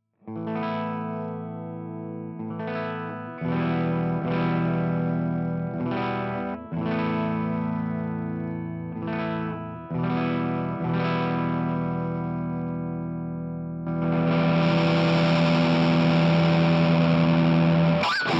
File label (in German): Egitarre Raw erst min Gain dann Gain auf 12 Uhr